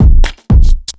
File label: 4 floor beat, trance